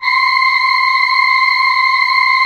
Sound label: SYM CHOR C 6, Korg; Back In Time Records; Korg Universe VOL-1; Korg SYMPHONY2